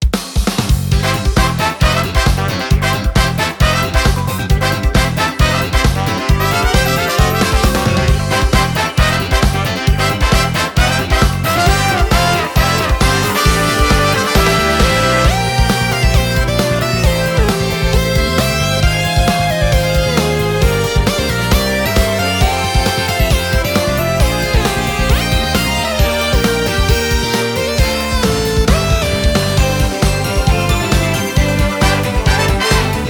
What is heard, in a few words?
Music event; Power Pro Kun Pocket R